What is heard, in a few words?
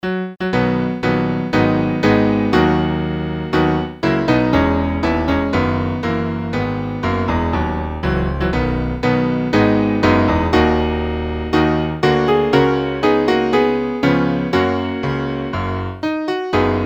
die stem piano